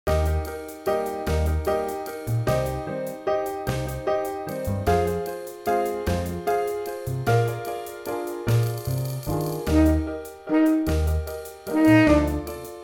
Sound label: waltz; toras